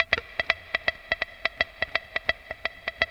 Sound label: AMB, Disco Fever drum samples; Best Service ProSamples vol.48 - Disco Fever; AKAI